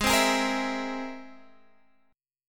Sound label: C Augmented 7th Ab